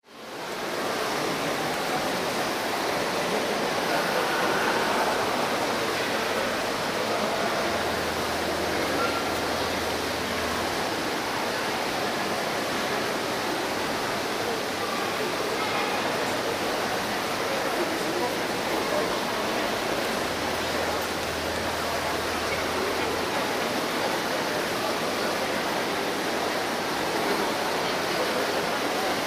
Leeds Victoria Quarter covered arcade passage couverte